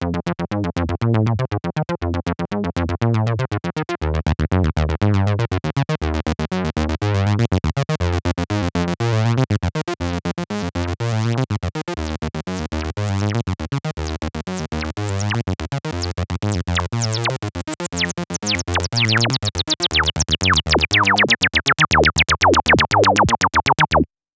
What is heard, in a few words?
seq bass auto osc; davesmith mopho